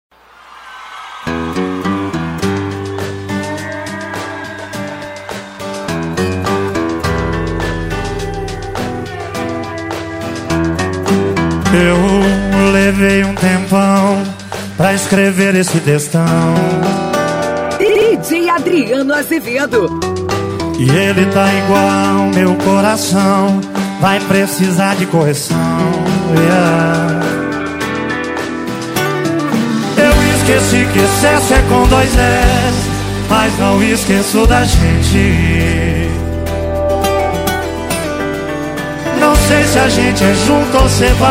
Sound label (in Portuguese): eletro funk e sertanejo